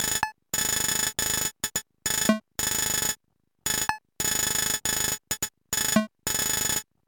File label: rhythm glitch